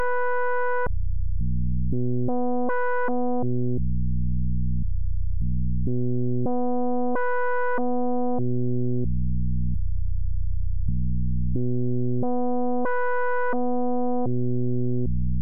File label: vcf lp4, vcf filter clicks; click artifacts when changing the frequency; PURE DATA